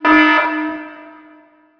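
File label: ambience signal horn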